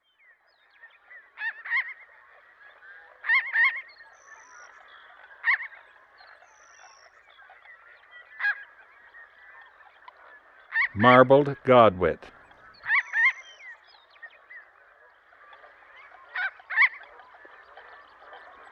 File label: Marbled Godwit; Western North America; Dan Gibson; Wildlife Identification By Sound